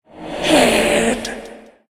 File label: sword hit